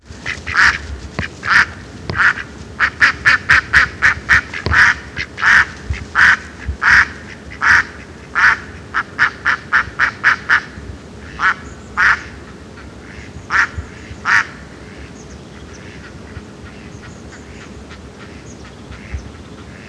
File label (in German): erpel und genervte ente